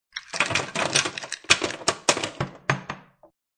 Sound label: Ice Cub Snk Dmp, Ice cubes banging and clanking in sink.; Ice Cubes Dumped in Sink 1; Drink Mixing (Sound Effects:Bars)